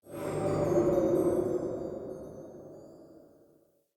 Ancient Wind; UnderCity

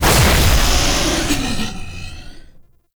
Power Grid Button; Warframe; Duviri Murmur

